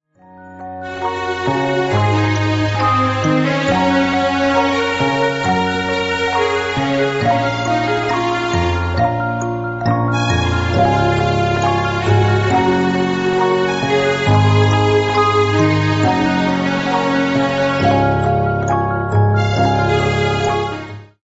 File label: violin 135 jingle; Jingle gratuito navideño; Jingle gratuito navideño: campas y trompetas